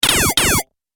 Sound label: Triple Laser Blaster; Laser Gun Sound Effects; An Orignal Sci-Fi Laser Sound Effect